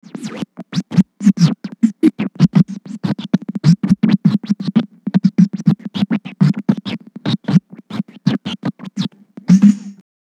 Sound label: long scratch, Vinyl Scratches